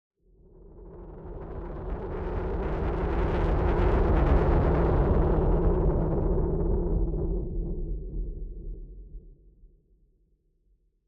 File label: WHOOSH, Digital Horror; Yakumokobe; Digital Horror Full Pack